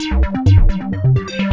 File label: PHASER, DANCE; Pro-Rec - Synthsonic; Z1 DANCE